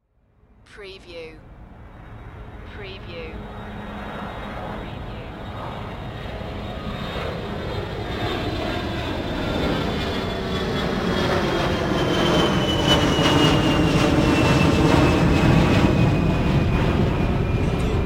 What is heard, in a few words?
Airplane Fly By